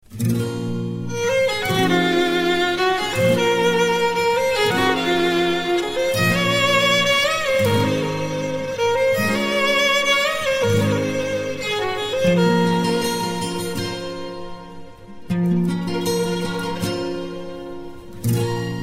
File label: violin and guitar; Electronica